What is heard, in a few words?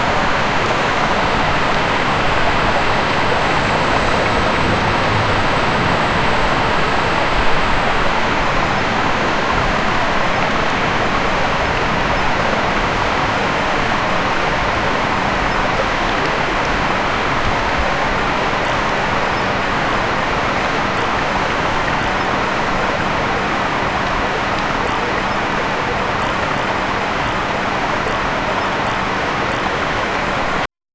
crossing wooden bridge, bridge